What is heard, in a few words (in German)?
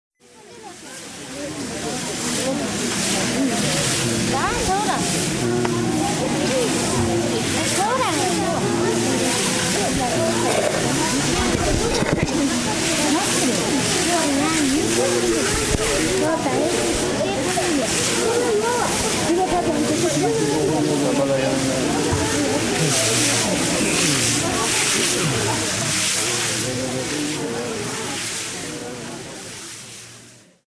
Lhasa Jokhang Niederwerfungen